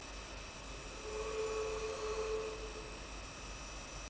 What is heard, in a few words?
Buzz; Highlander